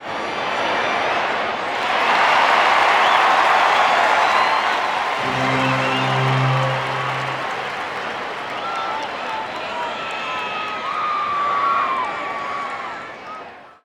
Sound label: Baseball Crowd Reaction Hit; Crowds